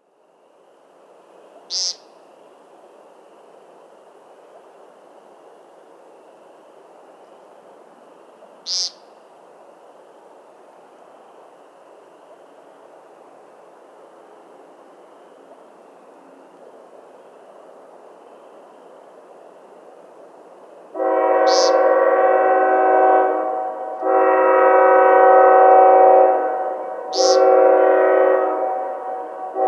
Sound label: Upland Chorus Frogs American Woodcock Train Coyotes